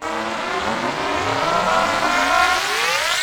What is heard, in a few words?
Uplifter